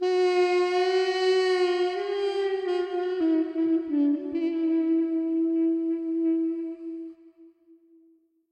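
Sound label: duduk sounds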